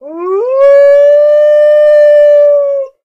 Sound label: pseudodog howl